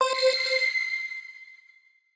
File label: Cyber